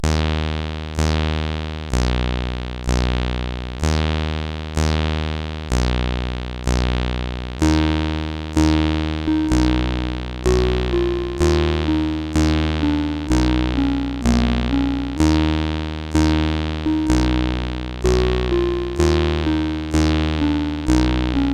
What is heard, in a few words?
Funeral, CSG8580R5; TNR Funeral